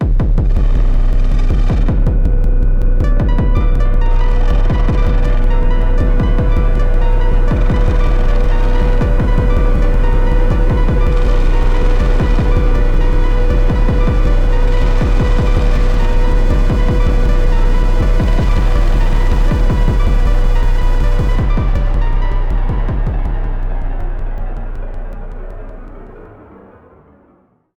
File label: Ear Plug Amb, gamerip